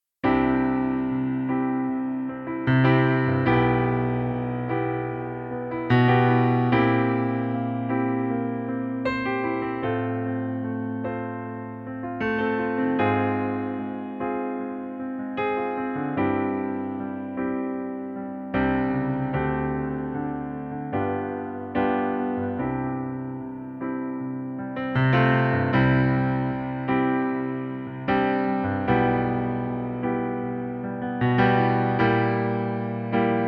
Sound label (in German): Pianoplayback C-Dur